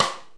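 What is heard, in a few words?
snare, Multisampled Real Kit; REAL LIVE KITS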